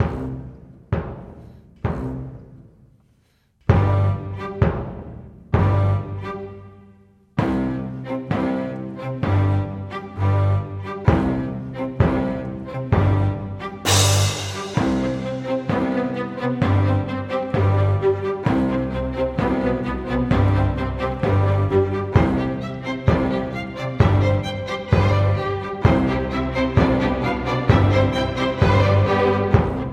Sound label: National Anthem Instrumental